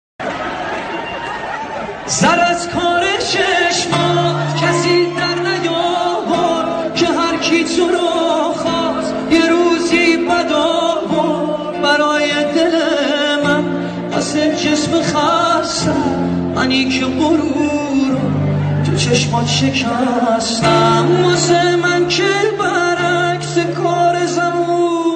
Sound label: Guitar